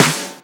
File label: Snare; Vengeance Samples